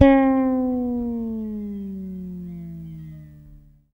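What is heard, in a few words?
6-string fretless bass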